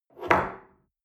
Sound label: espresso grab from table slide medium, restaurant cafe